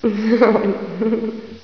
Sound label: giggle